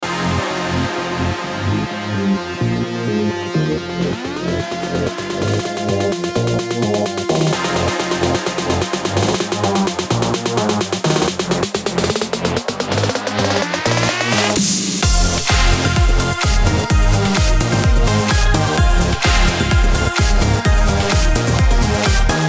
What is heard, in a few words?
MUSIC BED EDM SUMMER HAPPY SUNSHINE PARTY